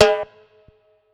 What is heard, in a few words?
Kit, breakcore Drum Hits